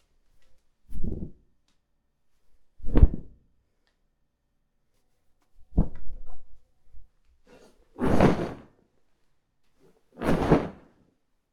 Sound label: Large Wings Superhero Cape Foley